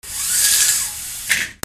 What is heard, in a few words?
HOUSE